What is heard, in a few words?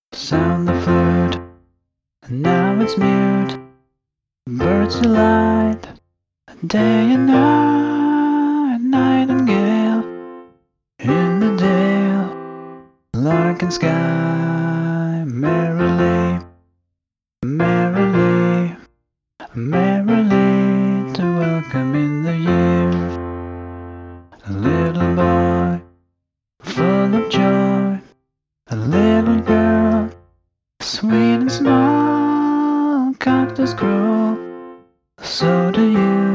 scratch vocal